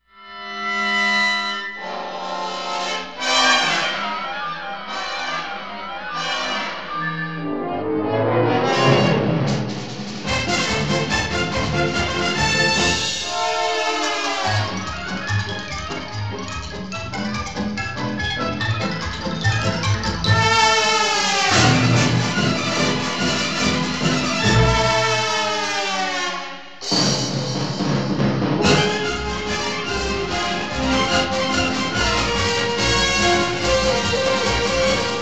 Instrumental; Disney

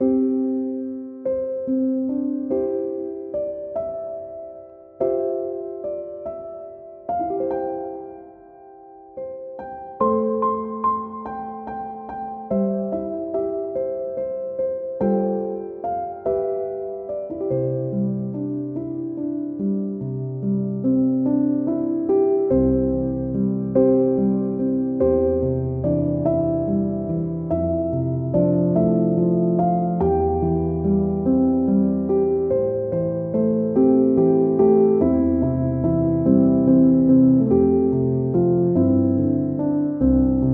Row Row Row Your Boat (Piano)

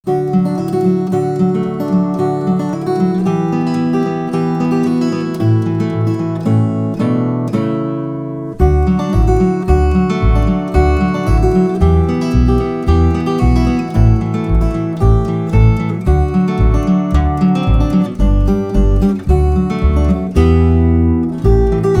Instrumental

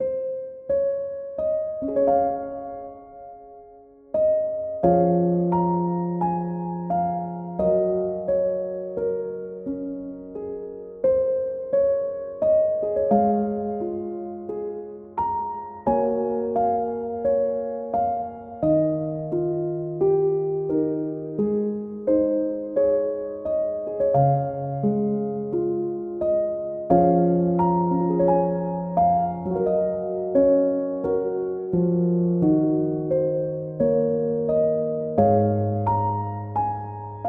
Danny Boy (Piano)

